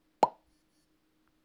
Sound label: pop sound